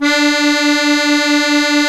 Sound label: musette D3, accordion